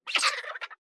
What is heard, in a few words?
Cry; Zephyruff